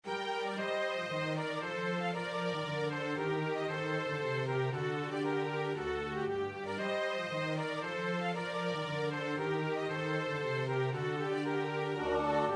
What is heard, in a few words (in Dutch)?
sopraan, koorpartij oefening; Purcell (Henry); King Arthur